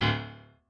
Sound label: piano